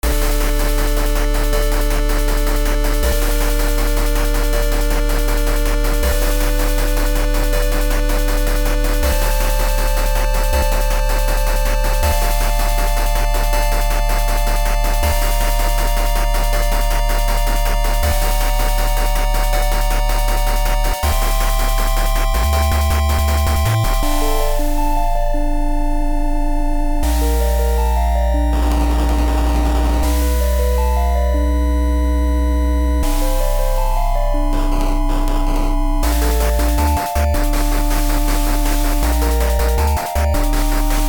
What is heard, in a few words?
boss fight; PPdastr Studio